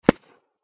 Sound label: explosion very small pop; Explosions; McKinney Sound